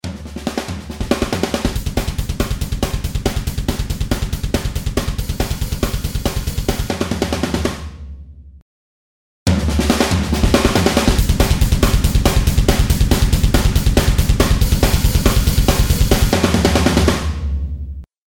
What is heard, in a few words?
loop